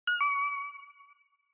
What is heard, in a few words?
Inspiration High